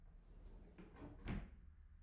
drawer; IEEE AASP

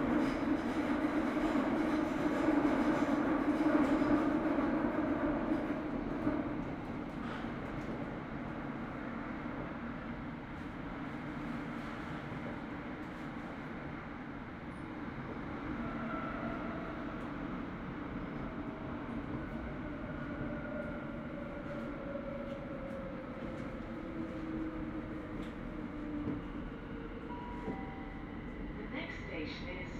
tube, scenes; IEEE AASP

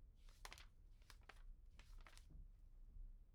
pageturn; IEEE AASP

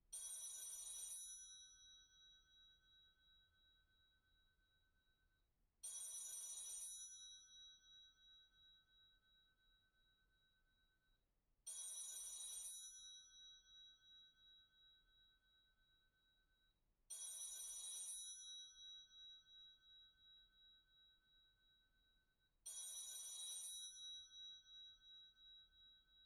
phone; IEEE AASP

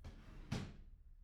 drawer; IEEE AASP

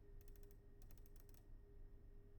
mouse; IEEE AASP

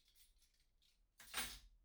keys; IEEE AASP

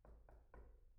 knock; IEEE AASP

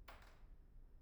pendrop; IEEE AASP

